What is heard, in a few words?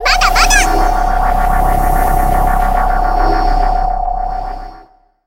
tower repair